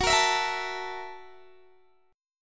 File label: Gb 7th Sharp 9th, Guitar, Open D; chordshape; down